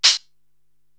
HH Lofi Close, Korg TR-Rack drum machine

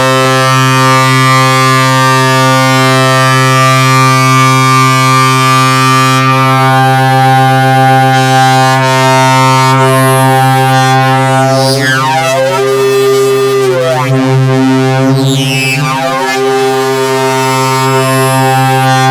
C3, synth; 90 s Sample CDs; NiceBeats; SynthLine VOL-25

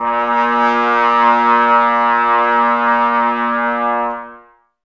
brass, Tbs Mute

